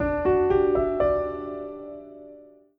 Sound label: piano notification